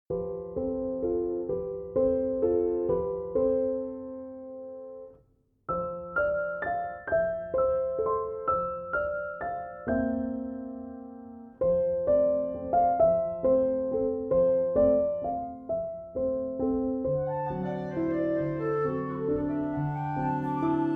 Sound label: Orchestral